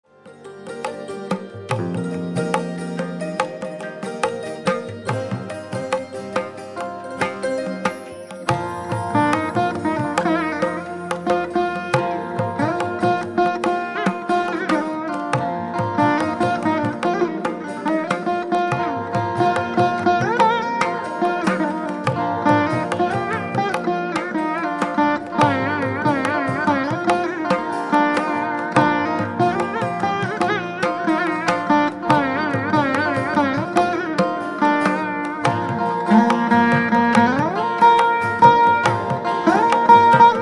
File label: veena fusion; madhava mamava